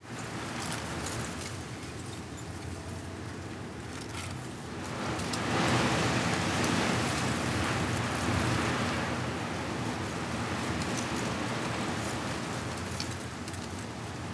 tree; Seer; GamigoNA

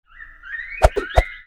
Vampire Bat Attack

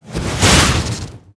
light strike

